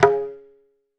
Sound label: Tabla Na; Roland BK-5; Percussion